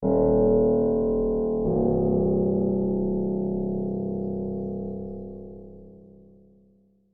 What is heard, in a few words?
Tension Piano; Mob of The Dead